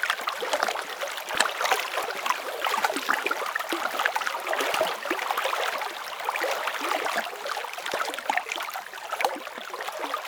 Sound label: ambience water